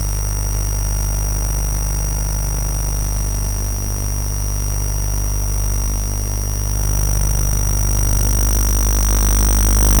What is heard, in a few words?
the hum of electronic circuits buzzing and whirring